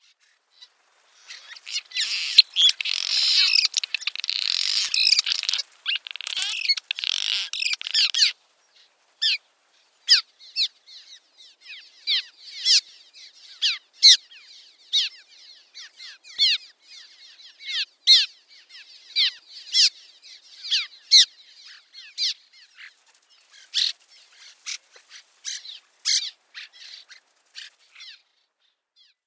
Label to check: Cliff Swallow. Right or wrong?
Right